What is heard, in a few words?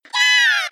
yah; kzdokuroku